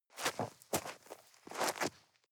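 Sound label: step gravel debris scuff, footsteps; schritte; menschen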